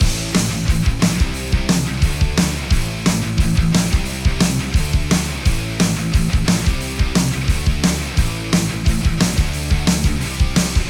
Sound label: Metal; Avec HHHusher